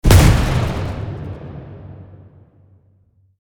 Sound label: Pirate ship cannon single fire